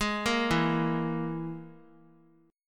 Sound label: E-Major Flat 5th E; arpeggiated; JGuitar